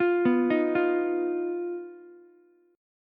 Eb Suspended 2nd Sharp 5th Eb down Ukulele, Ebsus2#5 chord; Ebsus2#5 arpeggiated; JGuitar